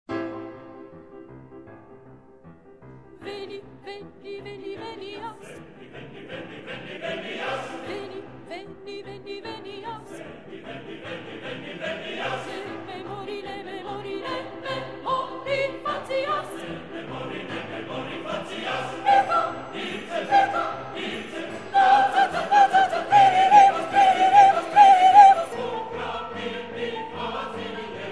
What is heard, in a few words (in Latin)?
Veni veni venias Coro 2 Soprano up to tempo